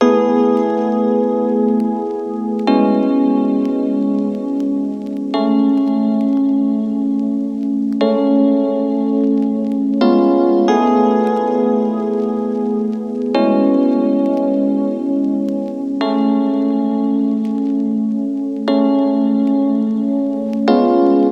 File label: Lofi